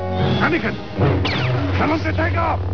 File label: takeoff